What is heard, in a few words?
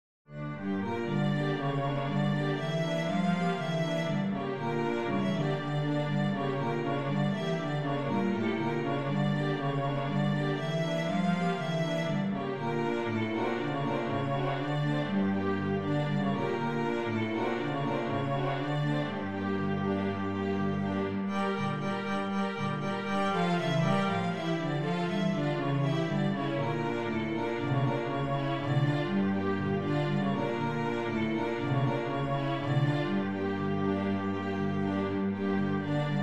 bulgarish